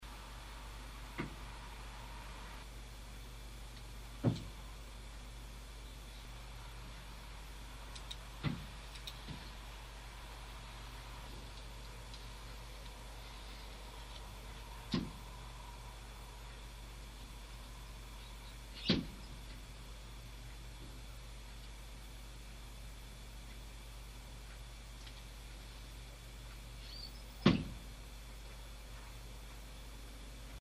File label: window close